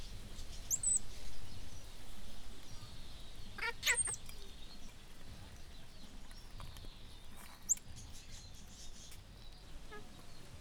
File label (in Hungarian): közlekenyek, bolíviai mókusmajom; jászberényi zoo 2015